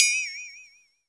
FLEXITONE; AKAI S-Series CD-ROM Sound Library VOL-5

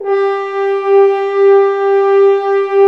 BRS FHRNF, F.Horns, FHns Dry f; Roland LCDP06 Brass Sections; F.Horns 2 f

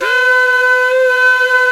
Flute, Singin' Flute

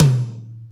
Yamaha EX-5

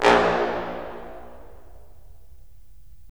BRS, Roland LA Composer

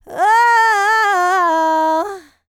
LICKS, Vocal, R&B; ILIO; Dance; LT R+B

